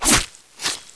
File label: knife stab; cstrike; codlife